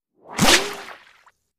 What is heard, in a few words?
Bullets Slice Water